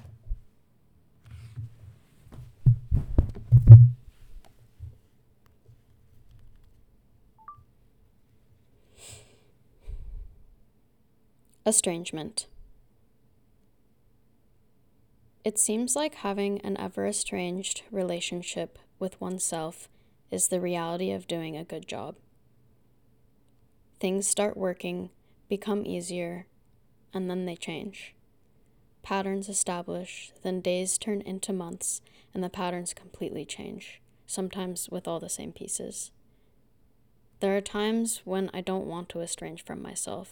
vocal take

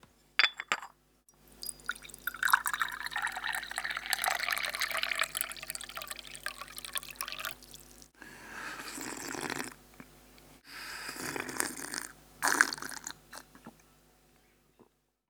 pouring tea recording

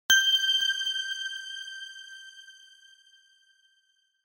shine

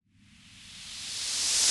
riser

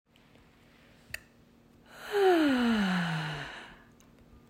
yawn

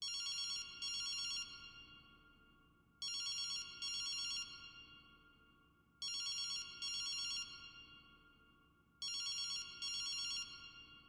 Telephono

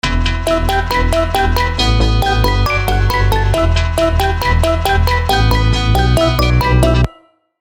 bass Step; hypersock

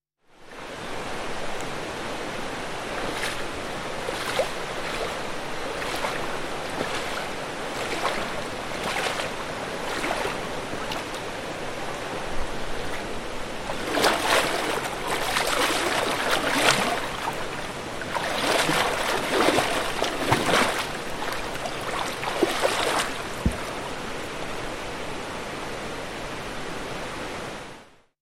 man walk; bbc

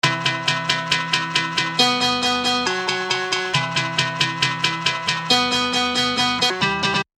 guitar

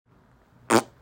Fart sound

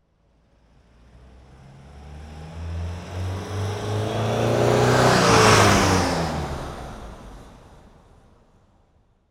Car Passing By

